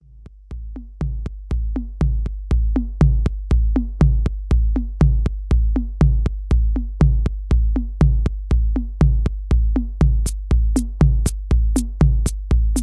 Lullaby

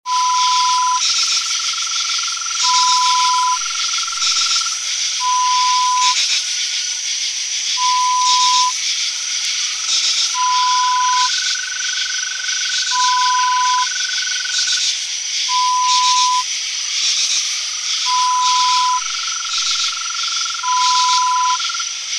Evening Crickets

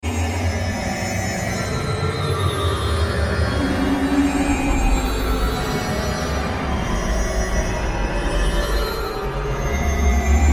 Sci-Fi SFX

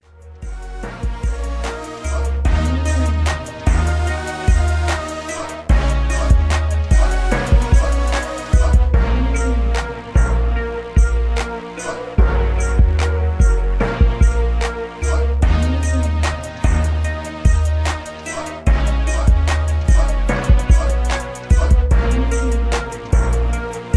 74BPM

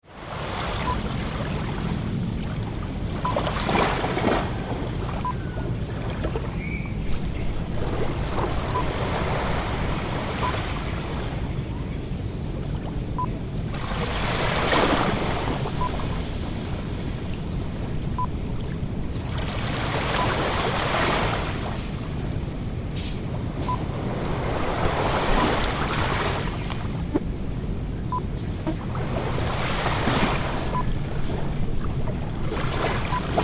Sanur Beach Waves Lapping

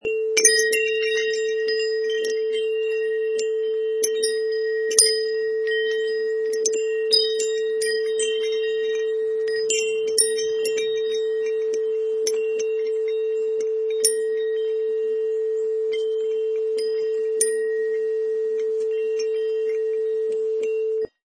Wind chimes Outside

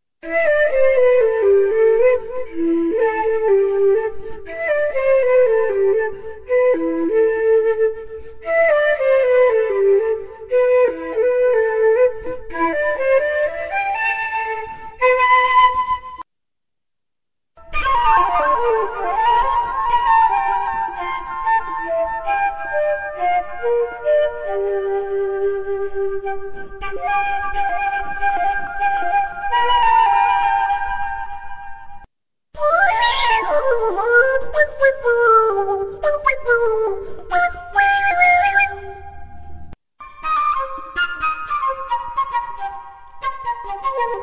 flute

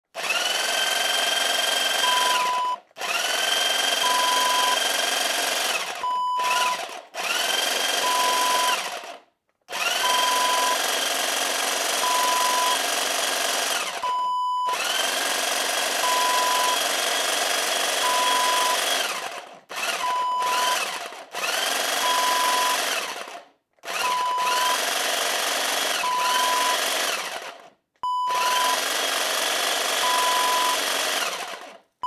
hedge trimmer

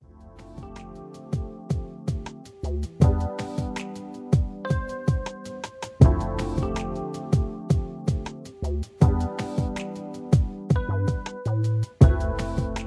Smoking Jazz

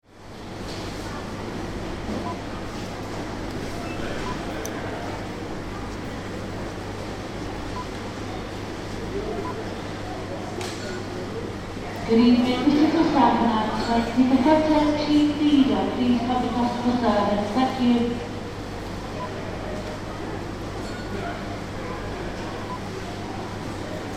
Super Market Ambience Announcement; Tesco

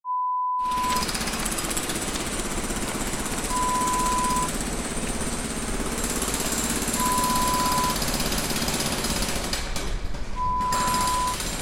Construction Site sounds

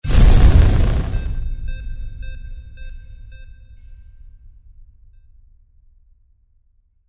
sci fi magic fire start; windy beach audio

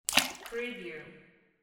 water splash

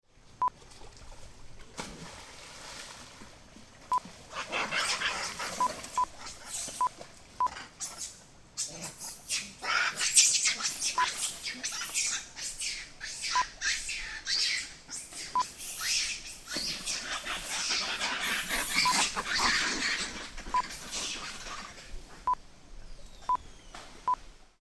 Monkeys Fighting and Swimming

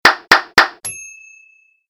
triple claps

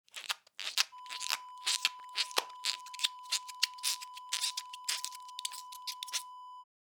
balloon twist

